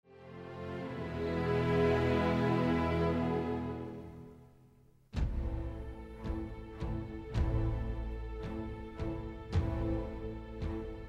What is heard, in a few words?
Intrada Pavan